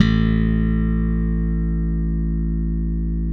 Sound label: SLAP BASS, Electric Basses